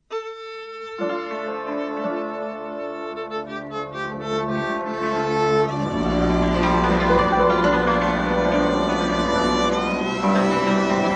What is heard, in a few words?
cymbal csardas